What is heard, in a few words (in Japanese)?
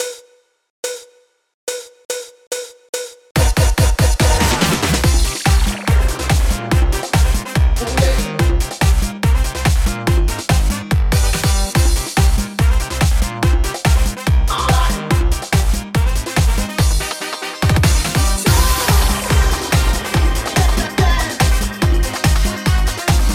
bansou